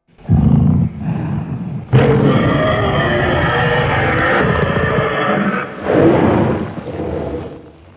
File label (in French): Dinosaure